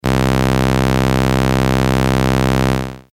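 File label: filter, ENSONIQ SQ-80 Digitally Controlled Filter (with manual Q); Using a "Saw" wave as a starter you can hear an unfiltered version of the wave; notice the slight edge to the sound.; four pole analogue low pass filter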